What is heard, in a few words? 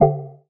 multimedia removal select tone; dustyroom